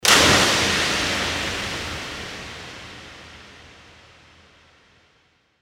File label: ambient stadion flood light switch on